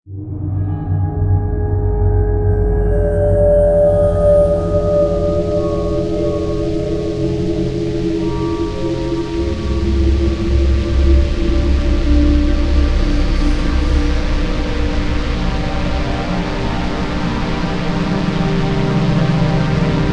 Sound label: Forge Amb; yaiba ninja gaiden z gamerip 2014